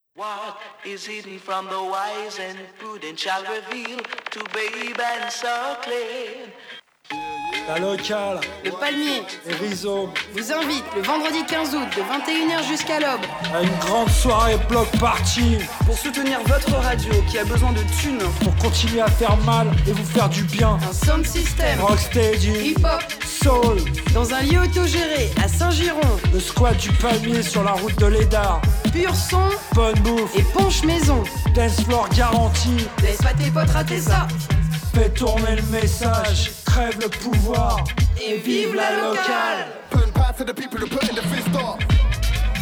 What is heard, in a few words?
BLOCK PARTY JINGLE